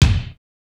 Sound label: trebly bass drum one shot g key; Duration 0:01; Samplerate 44100 Hz; kick drum samples